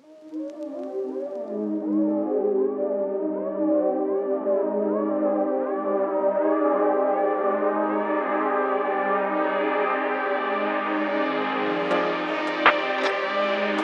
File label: trap; traktrain; the bag